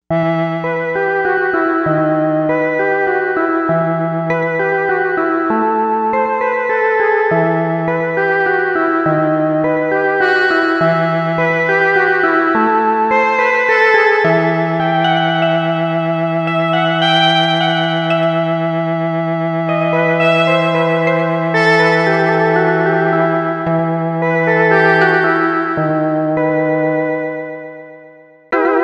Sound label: Novation UltraNova; Factory Patches